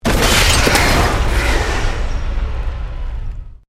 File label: Explosion Medium Metal Glass; house, building or vehicle effects; Bombs; Created explosion effects; SoundEffects Foundation2